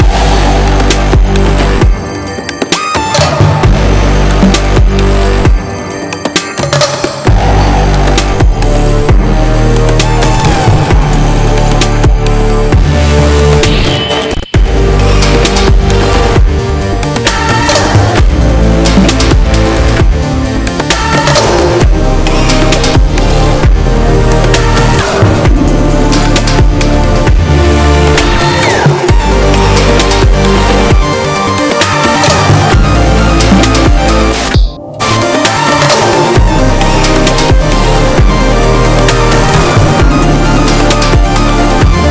Danger Loop 132bpm

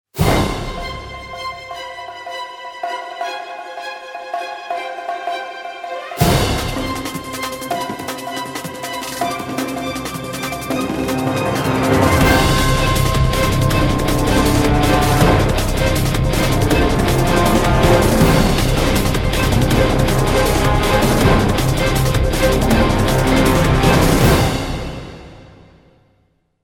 Action Short; Emergency 2013

